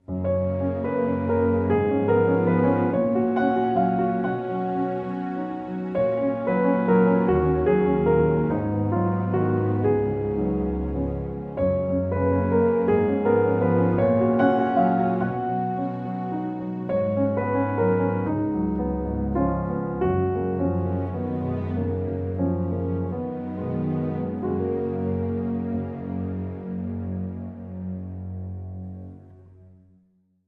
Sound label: With Strings